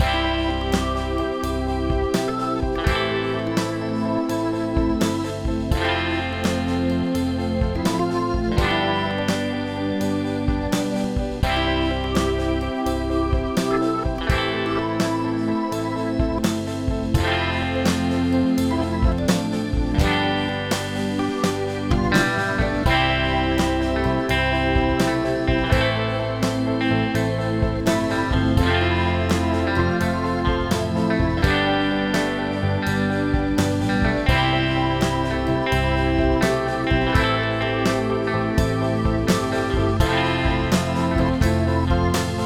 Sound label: Doo Wop Triplets; iOS GarageBand